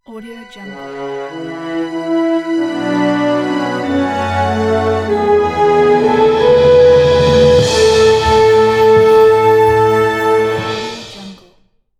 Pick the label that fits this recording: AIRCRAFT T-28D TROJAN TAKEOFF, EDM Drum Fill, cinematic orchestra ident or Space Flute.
cinematic orchestra ident